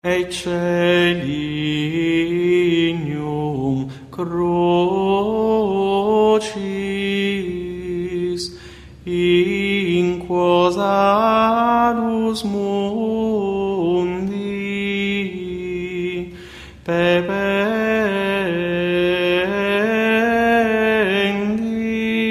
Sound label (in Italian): Canto Gregoriano; Ecce lignum crucis